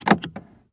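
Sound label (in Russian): звук открытия автомобильного бардачка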